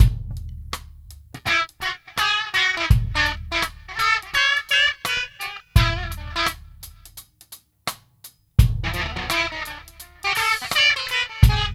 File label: LOOP